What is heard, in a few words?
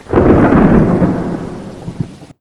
thunder; SuperTuxKart